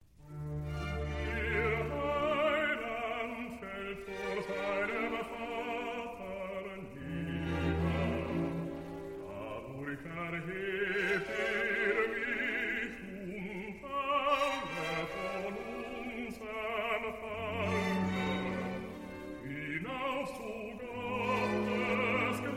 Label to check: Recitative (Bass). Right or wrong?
Right